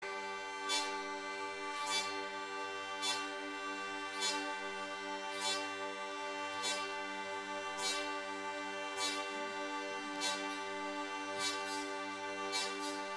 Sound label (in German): schnarre